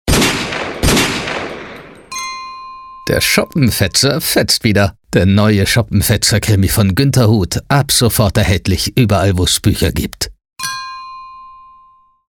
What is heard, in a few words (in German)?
jingle